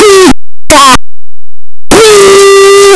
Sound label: pikachu cry; pokeyellow